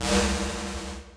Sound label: MOVE; C64 Dreams; Multi-Sound Default